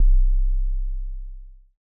808 LOW

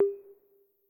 Clink, Percs

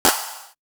EDM, FX

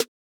Open Snares

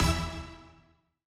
HIT

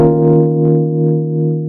One Shot; Sledgren x Deedotwill

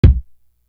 Kick

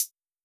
Hat; Uncle AI; Closet Hat

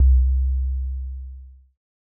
808 MID

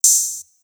Open, Open Hats

